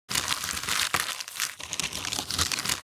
Paper trash rustling garbage

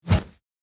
Grenade throw launch whoosh; Military Equipment

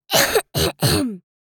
Clearing throat girl kid child

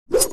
Throwing a knife no hit

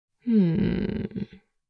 Adult female pleasure moan

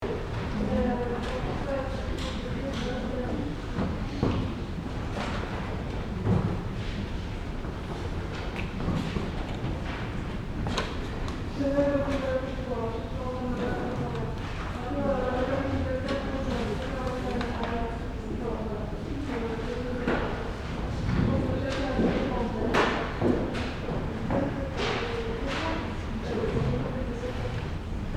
Library ambience room tone loop

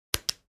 Casio calculator single button press

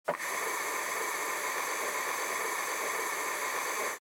Gas stove gas leak hiss; cooking

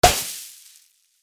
Celebration pop up

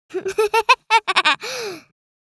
Little girl giggling

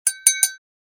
Glass cup tap call to attention

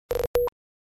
Small robot talking style beeping

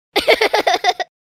Child girl aged 12 laugh

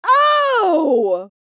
Shocked female reaction

